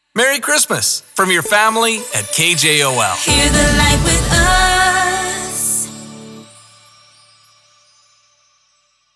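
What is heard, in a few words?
Sung Jingles Xmas, 115bpm D major, Sting; KJOL Radio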